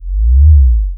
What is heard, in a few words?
vibe